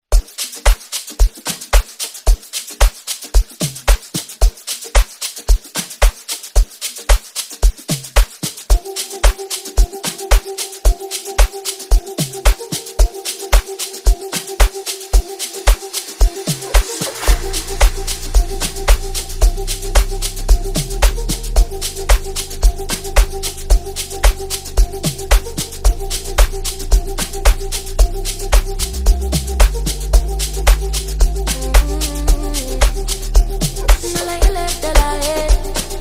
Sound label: Amapiano Mix